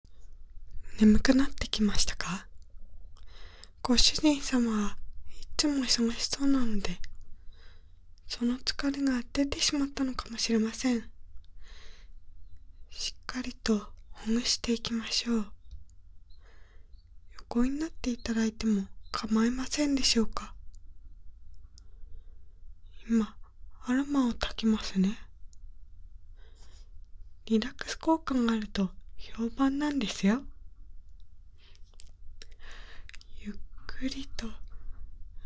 やすらぎハンドマッサージ

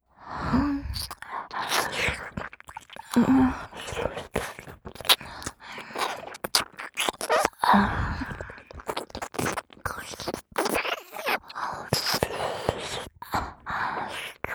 左右同時耳舐め SEX ループ, ASMR

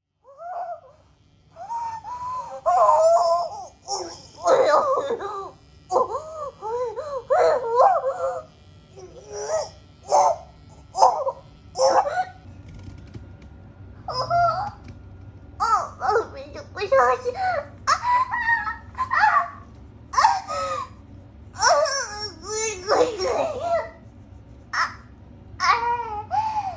下品すぎるオホ声だす美少女JKがの喘ぎ声; 快楽調教; マンコ破壊されマン汁垂れ流しながら

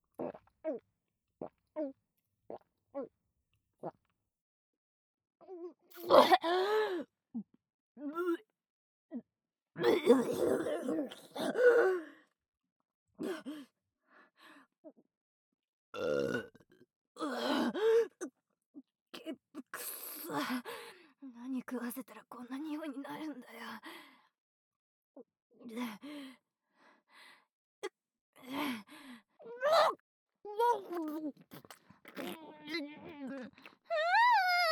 ゲップ切り抜き前後シーンあり

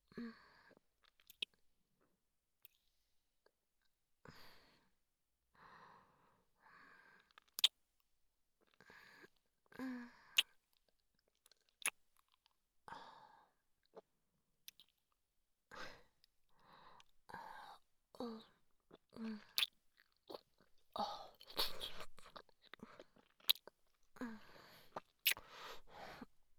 キス; SEあり

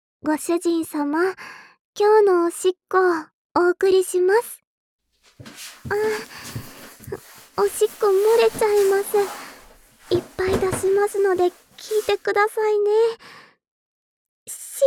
おしっこ たっぷり溜め込んでトイレ

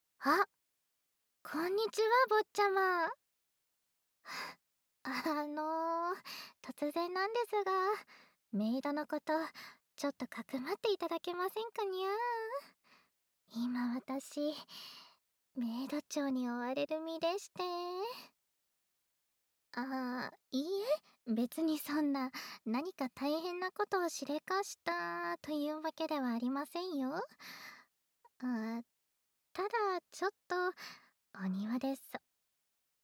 からかい耳舐め; はじめての手コキぴゅっぴゅ。

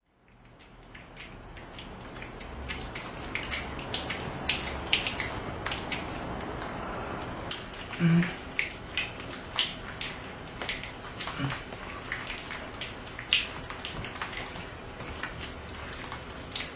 いやらしい吐息とローションのクチュクチュ音ASMR

